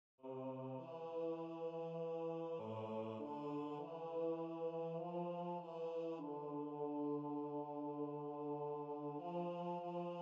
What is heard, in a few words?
choir